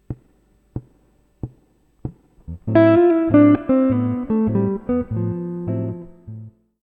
Modal Licks Aeolian, guitar

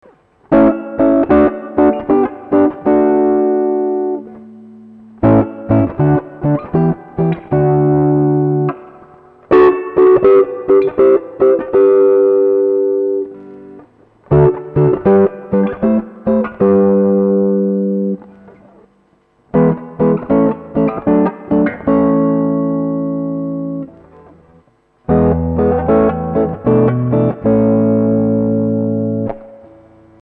triads as full chords, guitar

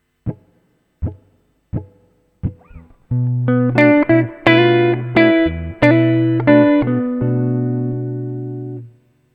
Minor Blues Licks Example

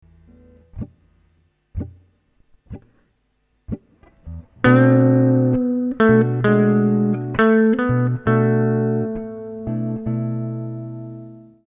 Minor Blues Licks Example

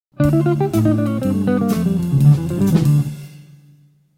Melodic Line

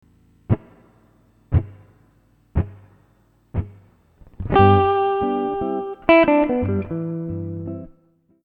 Lick

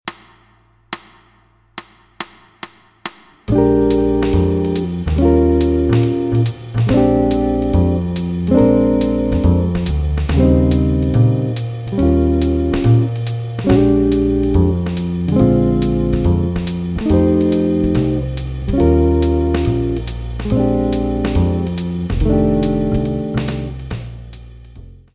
JAZZ BLUES CHORD ETUDE, guitar

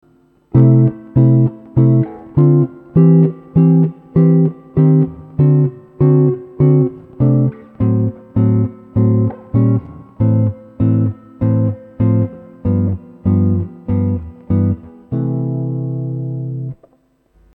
Chords

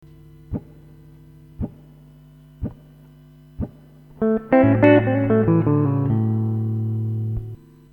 Blues Guitar Licks Example

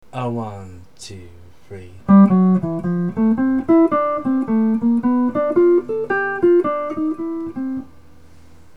arpeggios ii v i, guitar

